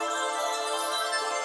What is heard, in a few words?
Wheel Loop; Romanov Riches